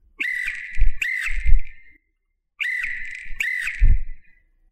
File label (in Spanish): silbato auxilio; silbato de emergencias